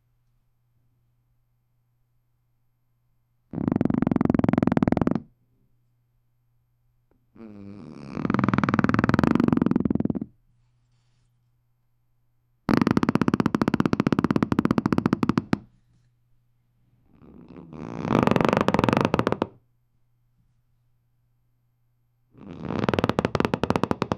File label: a bed frame starts groani